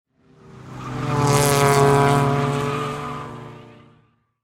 Car Race Car Pass By Distant; Power Car sounds; Car Sound Effects

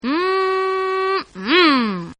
Lady Says MMMM MMM; Exclamations; Voice

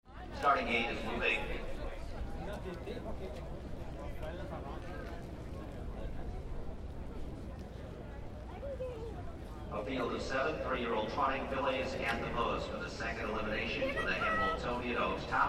Horse Racing Stadium, harness racing; Trotting horses run 1 mile race, horse race announcer calling race. Light crowd; Horse Sound Effects

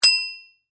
Music, Bell, Ring, Muted; Desk; Bell Sound Effects; Musical; Blast Wave

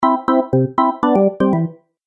Organ Game Music; Cartoon Music Sound Effects

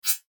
Error Message; Button, Electronic, Blurp, Sweeper; Multimedia; Production Element, Imaging Element, Accent, Transition; Electrical Button; Blastwave

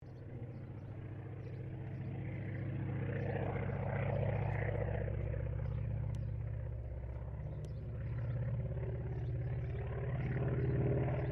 AIRCRAFT CA-28 CERES PASS

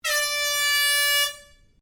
Airhorn Large Blast Long, Compressed air horn; Sports Sound Effects; Sports (Sound Effects:Backgrounds)

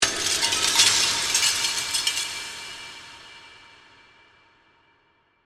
Hit Glass Debris; Production Element; BlastWave

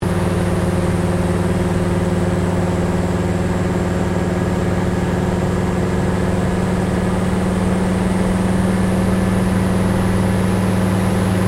AIRCRAFT CA-13 BOOMERANG APPROACH INTERIOR STEREO; Airplanes; Vehicles

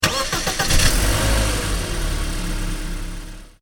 Engine: Start Engine, Car; Cars; Vehicles; Stuart Duffield

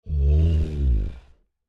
Creature Large Moan Exhausted; Monsters; Horror

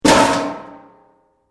METAL BOX; Metal (Sound Effects:Materials)

